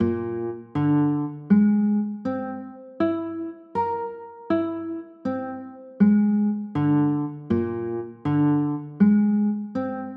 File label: b flat 9b5, chords